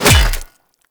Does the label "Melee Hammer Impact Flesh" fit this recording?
Yes